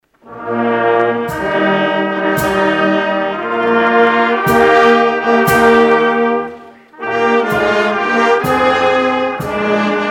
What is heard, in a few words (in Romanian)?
Fanfara; Elim Viena; Sambata Evanghelizare Germana